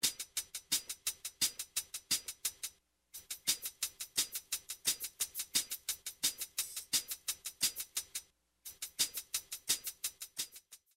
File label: hi hats; Hip Hop /R&B instrumental